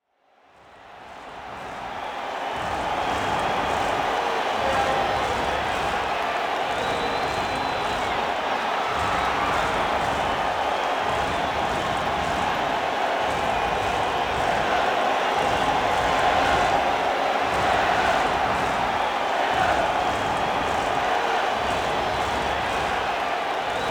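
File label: music title screen; Mortal Kombat Komplete Edition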